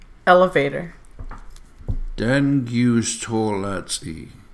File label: Elavator